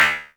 snare, Korg Electribe ER-1; Kit 12

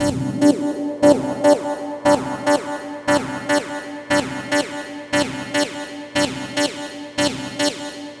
Techno Synth

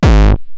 Roland TR-909 BD; BD Selection

